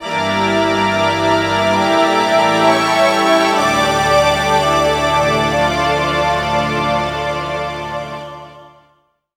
Game Over [Jingle]; Drihoo

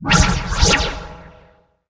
teleport; boss umbra

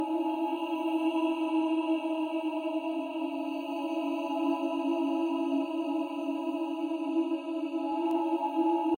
Hunting Music

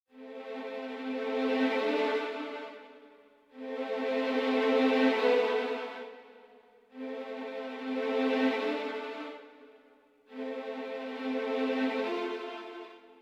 Music Amb Strg; MushroomBoss; Layer3

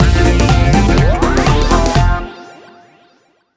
Stab; Buzz! Junior Robojam; PS2

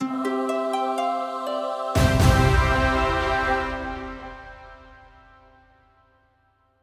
win stinger; Royal dance

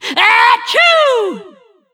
Sneeze Achoo; Nicktoons Nitro; arcade

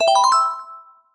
SFX UI Game Resumed; Mario Kart 8

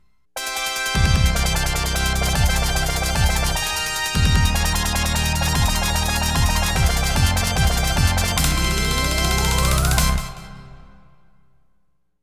Bonus Fanfare; Konami Game Music Collection

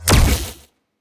Weapon Shoot

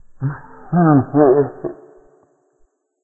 Laugh

